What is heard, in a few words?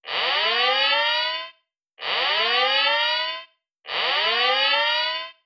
high alert; Hearts of Iron IV Death or Dishonor